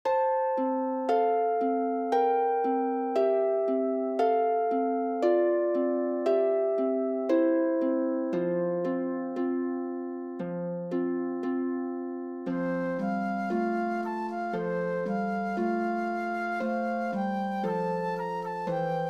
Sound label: Il Est Ne Le Divin Enfant with Flute